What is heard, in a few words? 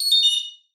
Breaker Correct